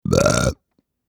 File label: belch